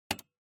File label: PC Mouse